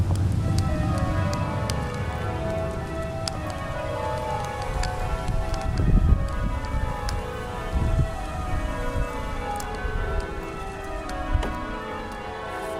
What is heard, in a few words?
worcester cathedral bells before a service